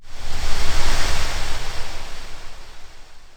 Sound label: Pandora Vapor